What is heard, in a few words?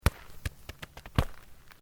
Sport Soccer Bounces Stopped Gravel, Soccer ball sounds